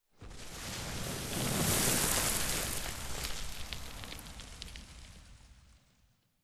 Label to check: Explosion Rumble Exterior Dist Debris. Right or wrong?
Right